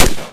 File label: Heckler AND Koch 416; weapon